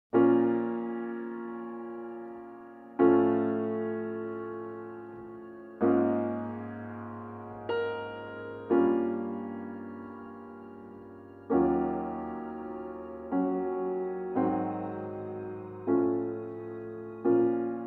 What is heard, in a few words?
Instrumental